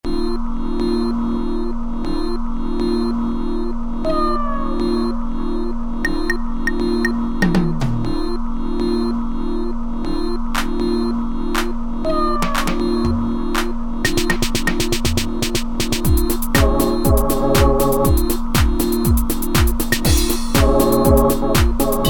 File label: Kit, E-mu Proteus VX